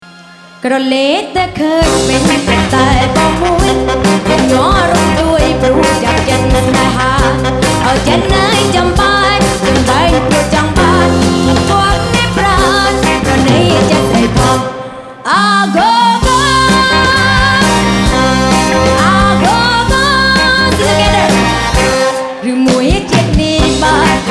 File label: agogo live